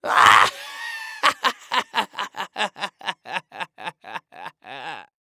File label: Human Male Laugh